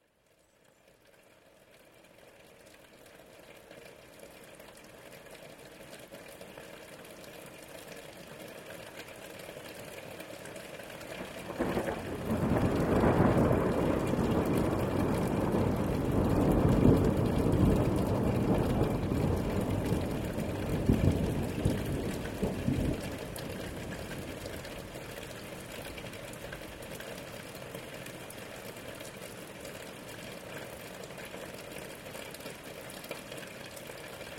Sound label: rain thunder